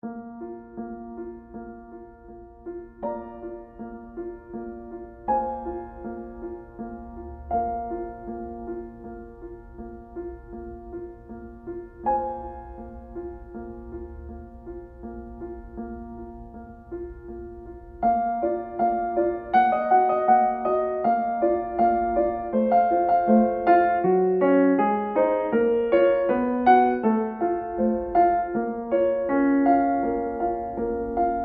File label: piano; big fish